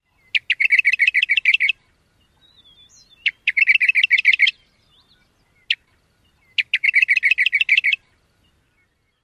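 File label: western meadowlark rattles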